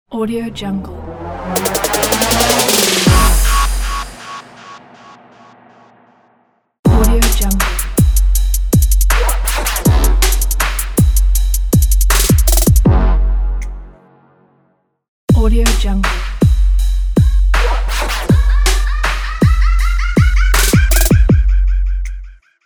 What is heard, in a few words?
trap logo pack